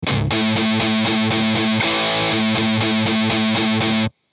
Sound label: Guitar